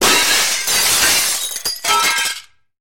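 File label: sounds of a mirror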